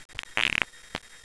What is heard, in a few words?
fart